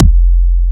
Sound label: Kick; WAProd Free Kick Samples 2018; WA Kick Pack 5